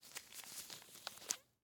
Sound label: Latex Glove; Medical; Blastwave